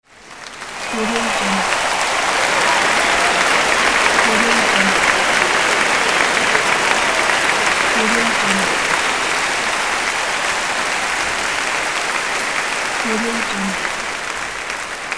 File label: large crowd applause cheering